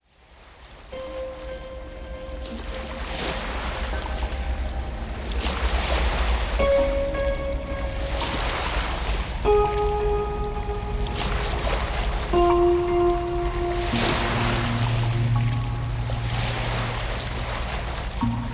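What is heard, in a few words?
zvuki okeana, ocean sounds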